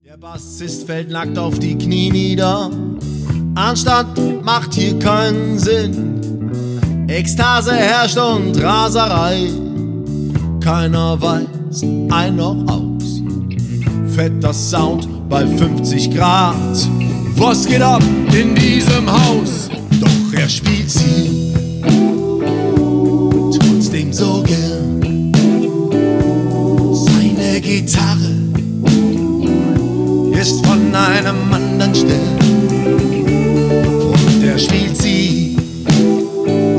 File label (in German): Gitarre